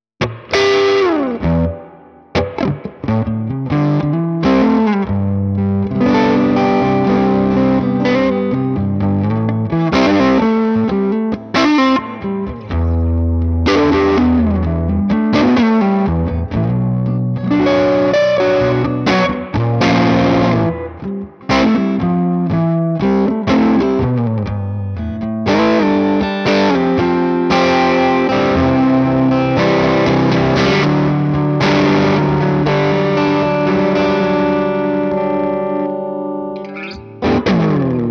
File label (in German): Morgan Amps SW50R Crunch EQ 2 Uhr Reverb 12 Uhr Bass Cut off Bright off